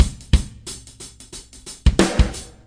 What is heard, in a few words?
86 bpm funki compression